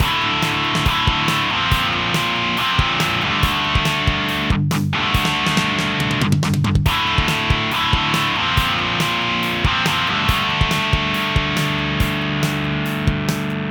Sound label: drive